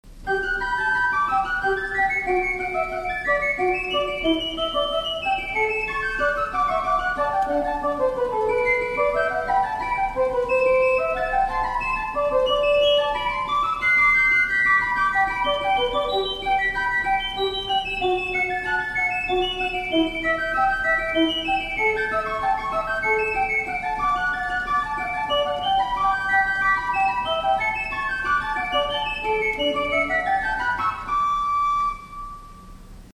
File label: Organ Great Doublette 2